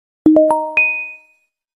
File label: lg ringtone; Lg 알림 음